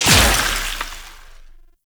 Explosion; Warframe; Moa Pet; Multron

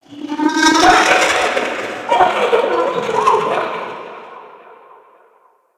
Medium Creature Chatter; Ambience; Under City

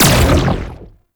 Gunbrella Shot Laser; Weapons; Warframe; Tenno; Koumei

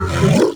flying mouth attack fire empty; Enemies; Warframe; ManInTheWall

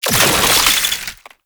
Brood Spider Spawn; Warframe; Oraxia

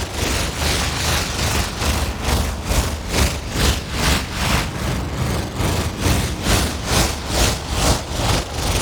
Moon Rotating Puzzle Loop; Ambience; Gameplay; Orokin; Warframe

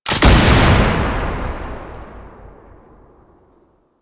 Assist Shot; gameplay; Warframe; Nineteen Ninety Nine; Quincy

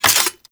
Sword Board Equip; Warframe; Tenno

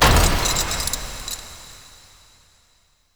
Vaults Cylinder Stop; Entrati

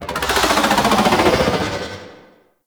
Baby Dragon Vocal Idle; Warframe; Duviri; Void Dragon

